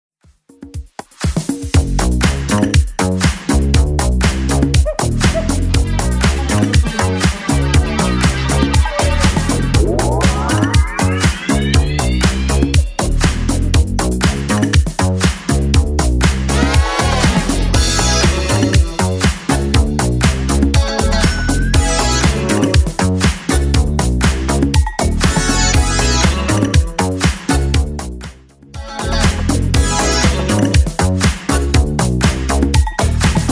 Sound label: 80s Club 120 bpm CLEAN